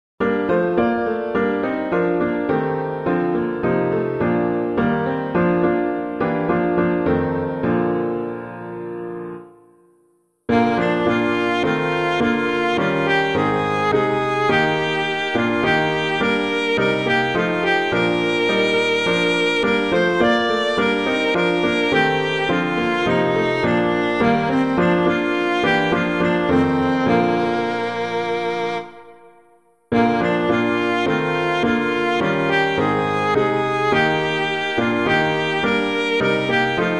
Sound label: piano